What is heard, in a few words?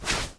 foley leg